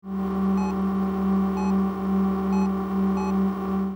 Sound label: Driving passing Cars; Turbo